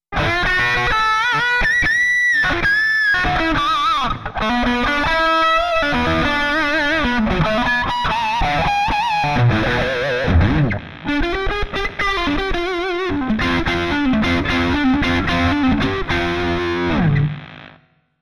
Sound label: WARP lead